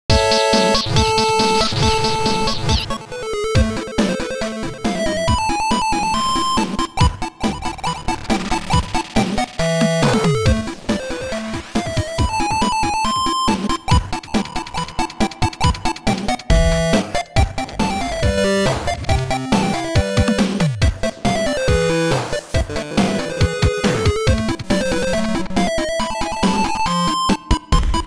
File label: Glitch Retro Turkish March; The End Is Nigh; Mozart 1783